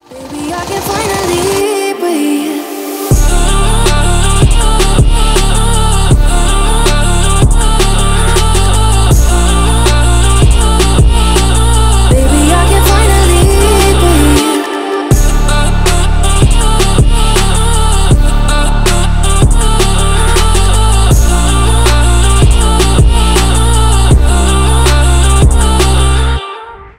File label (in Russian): melodichnaja vyrezka na zvonok